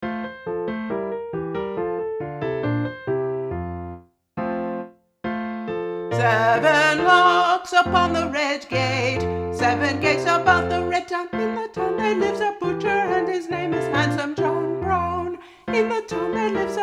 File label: Alto; The Handsome Butcher